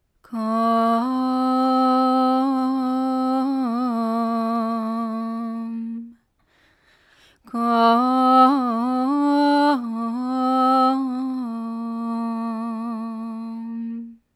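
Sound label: female vocal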